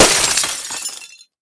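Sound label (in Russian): разбившееся окно после попадания в него мяча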